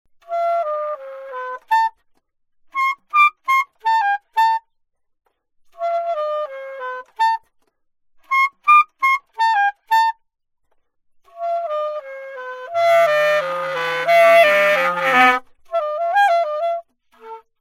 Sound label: altosax